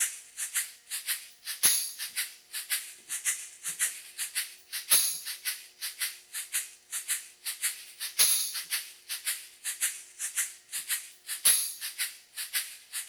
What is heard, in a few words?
perc loop shaker tambo 3 4 time; dallas